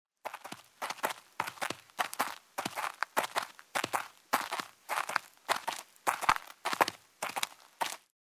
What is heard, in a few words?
Footsteps; Age of Empires III; cinematics